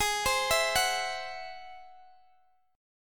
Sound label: G sharp Augmented 7th G sharp Guitar DADGAD